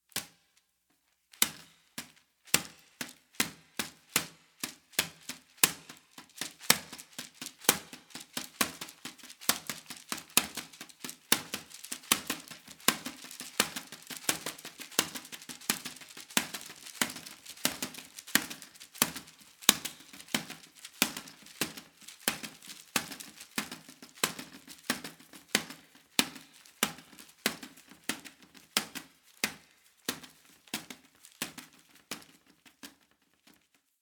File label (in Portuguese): JORNAL DOBRADO